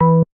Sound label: bass, programmable speaker